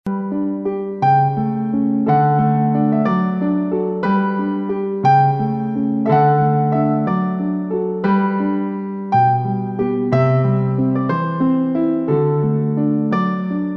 Piano; Christmas Piano Masters; About Distant Lands and People

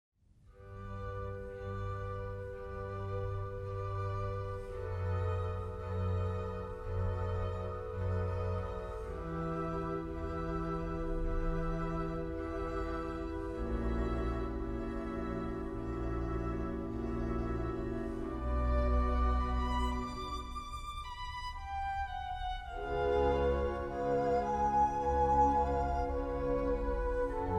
Violin Concerto in D minor, Largo; Vivaldi; La Stravaganza; op. 4 No. 8

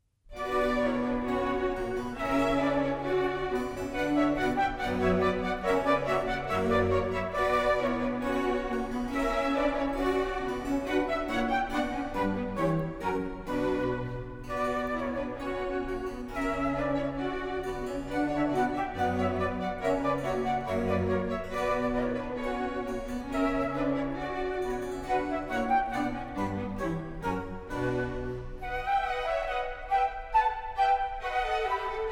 Orchestral Suite In B Minor, Rondeau; Bach; BWV 1067

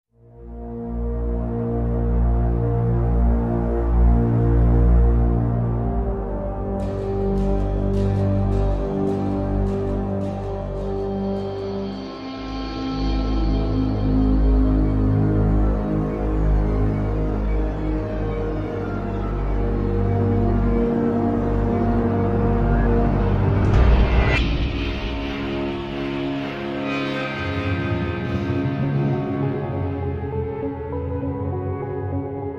Ambience

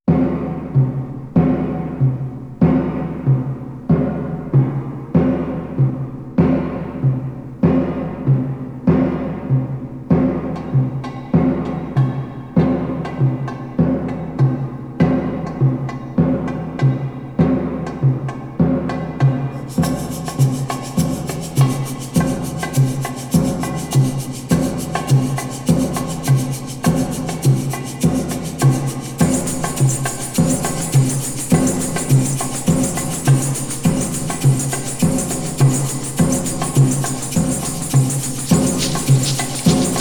Batucada

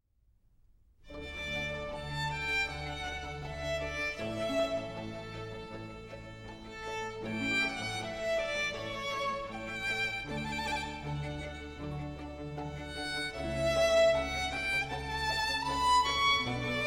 Concerto For Violin And Strings In F Minor, Largo; Vivaldi; Op.8, No.4, RV 297 L'inverno

